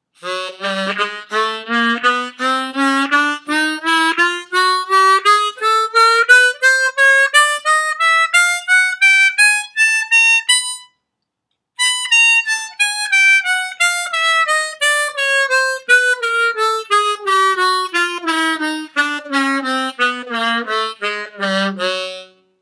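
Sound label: Chromatic Scale, HARMONICA; Seydel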